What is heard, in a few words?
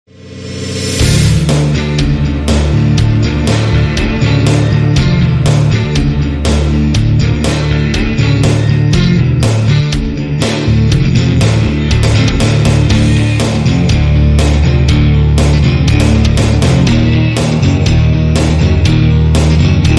Slow Motion